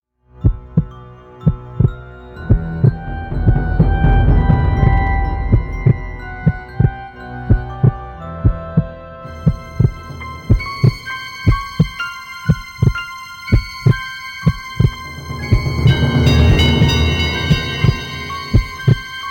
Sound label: heartbeat sound; ringtones